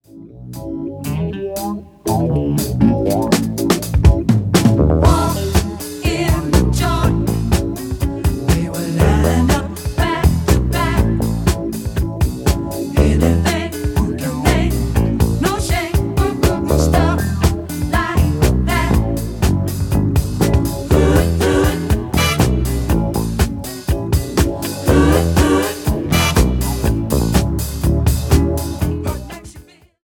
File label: jazz bg